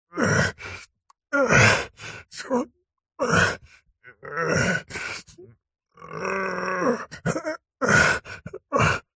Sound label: human male middle aged strained vocal grunt groan; zapsplat